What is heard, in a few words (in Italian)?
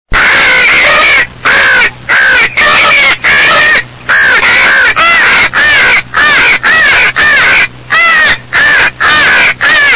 CORNACCHIA STRESS